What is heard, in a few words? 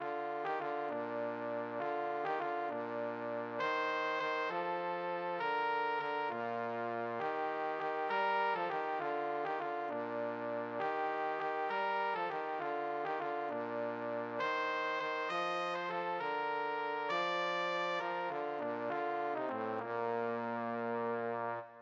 silent night, trumpet trombone duet